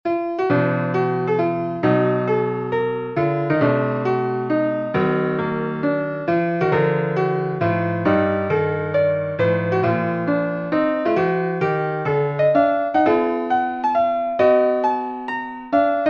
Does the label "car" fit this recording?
No